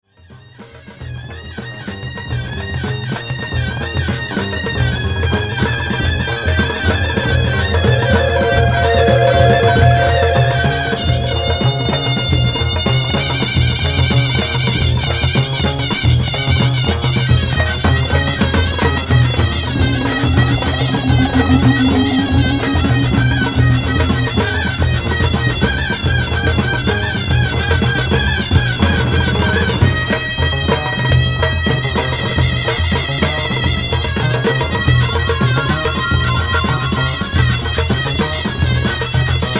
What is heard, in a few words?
oriental wind